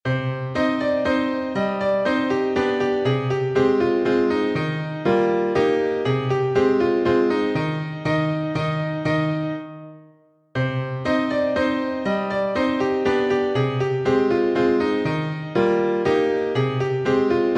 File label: Waltz